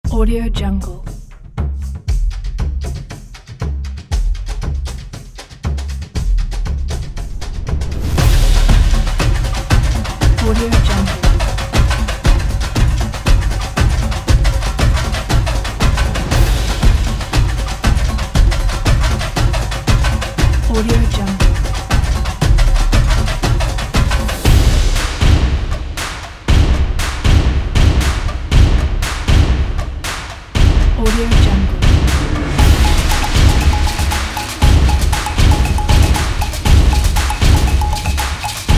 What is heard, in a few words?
samba energy